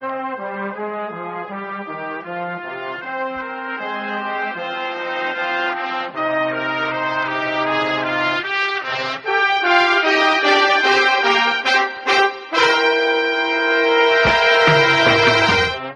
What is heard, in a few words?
horns, jazz loops